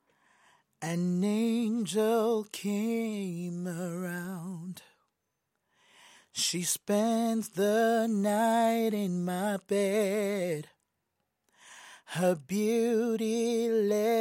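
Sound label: pop rnb, 108, B flat minor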